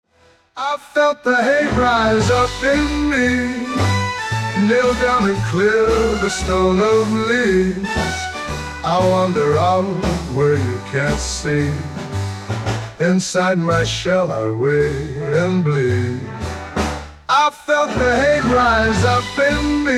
jazz

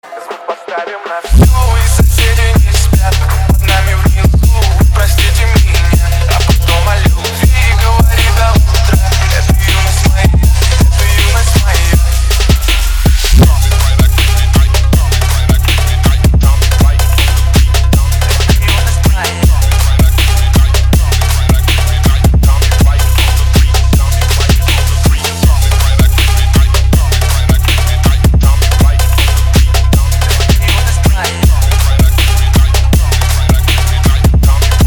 trap remix

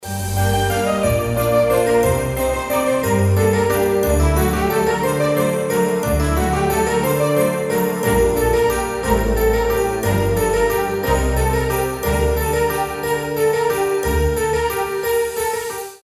carol of the bells orchestral

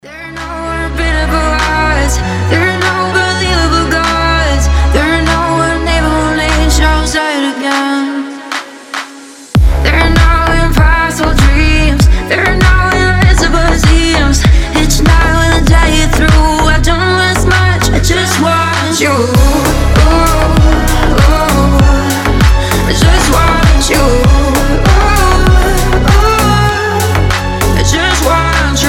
organ

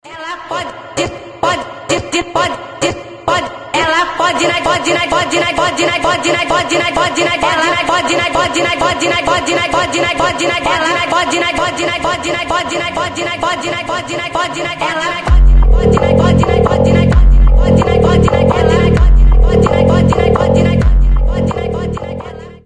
beat

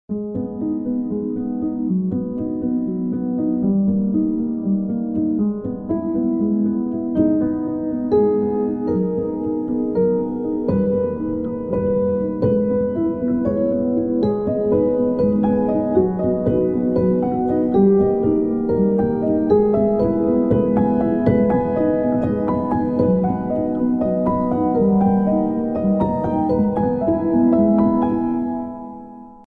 unfold ios 17 new alarm

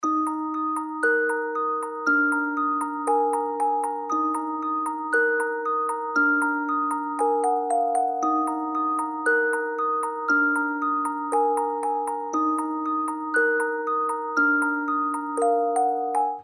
creepy doll music

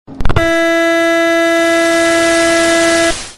jumpscare; Doors; Roblox

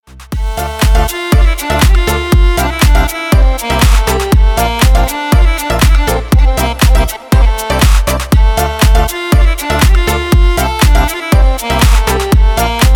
the violin effect

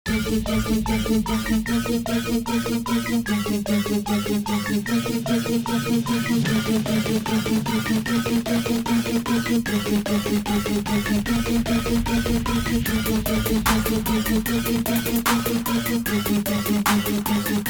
trap ring

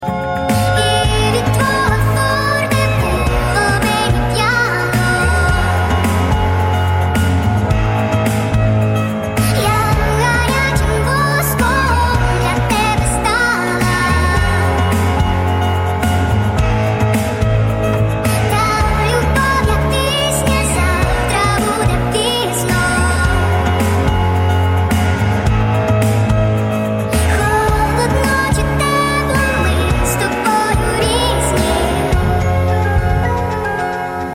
piano speed up

